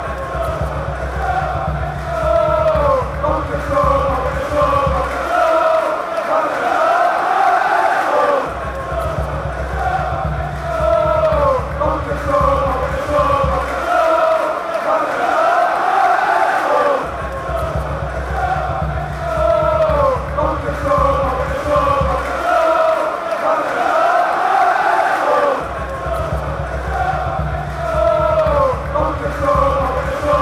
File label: belgium chant